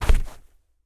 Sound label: compact grass fall; Golden Crayon Sounds